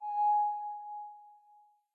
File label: air